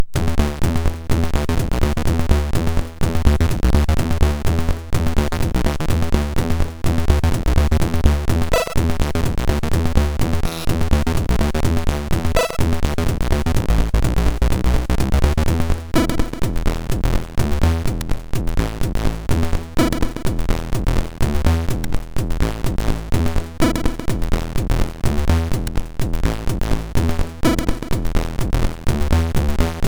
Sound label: Vroom Vroom, MOS 6581